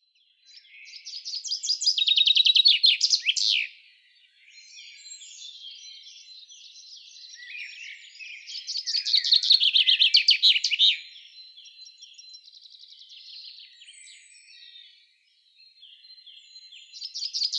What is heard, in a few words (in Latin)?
Fringilla coelebs; SETE